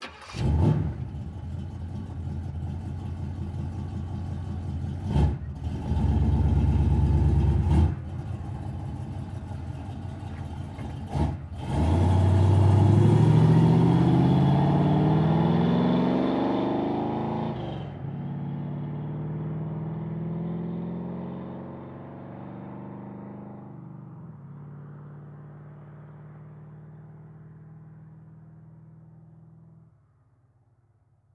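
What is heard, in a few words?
Muscle Car Start Reverse Fast Out; Vehicles; Cars & Trucks; Sony Pictures Sound Effects Series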